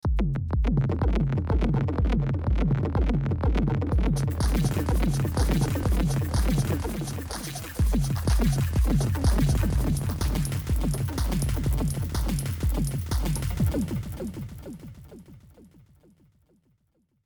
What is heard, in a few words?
Drums 606 APREGGIOS; R pitch 700 cents